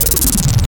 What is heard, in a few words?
CYBER KIT, DRUMS